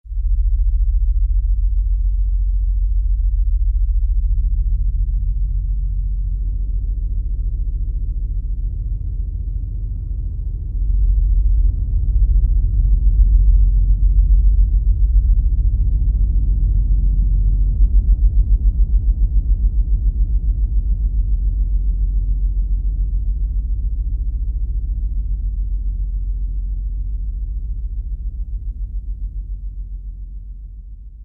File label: EARTHQUAKE, Oberheim OB-8; Factory Patches